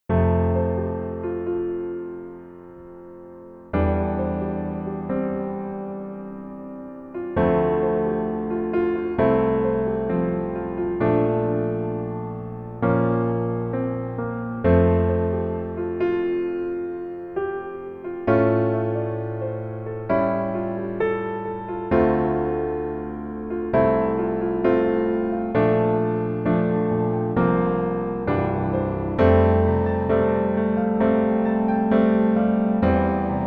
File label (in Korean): Piano ver 멜로디MR -1키